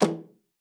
E3, alto sax; Xsample VOL-2

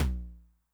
Noise LOW, 909 LOW TOM

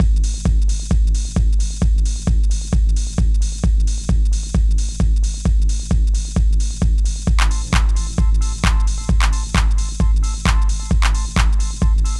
Belguim Beats 132 bpm; Big Fish Audio - Old Skool House; OSH Construction Kits

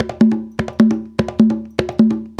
African Tap; African Contemporary; Spectrasonics; Supreme Beats

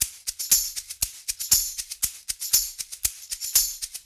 Shaker Tambourine, 70s Analog Funk; Sonic Foundry (Sony Creative Software); What it Is!; Good Times

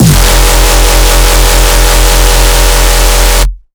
NEURO PUNCH SCREEE, bass

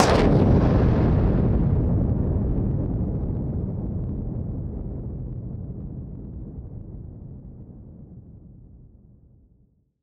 Noise Bomb Harmon; musicradar; bomb factory

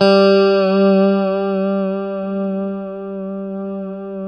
G 3, strat, JC120; Spectrasonics; Hans Zimmer Guitars VOL-1; JC-STRAT MF

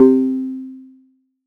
Hit, Stab & Hit; Bitkits

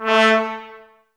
Woodwind Brass Ensemble; Miroslav Vitous

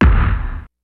DANCE KIT, DRUMS; Kid Nepro; Elite

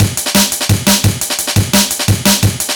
amen; Amen Breaks

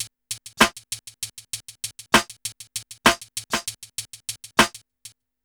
BEAT, Hip Hop; USB Soundscan vol.34; Burning Grunge Hip Hop; AKAI; TIMBA

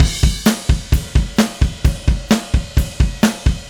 Drums Crash, Indie Rock; Sonic Foundry (Sony Creative Software); Indie Rock Anthems; Session 10 - Roswell

